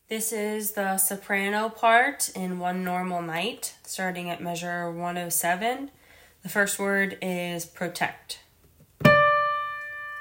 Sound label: soprano